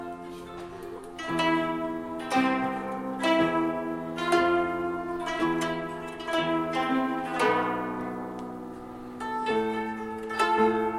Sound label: koto; 我が国の伝統音楽; 実際の演奏をほんのほんの少しだけですがアップします。; 両荘みらい学園